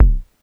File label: polyvox bassdrum, Drum Machines, Formanta Radio Plant Polivoks